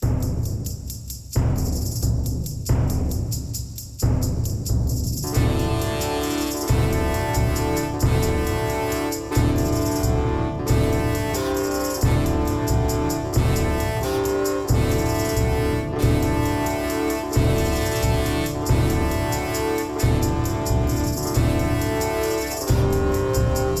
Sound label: Let The Horns Blow instrumental; 1428 shadows over silesia soundtrack 2022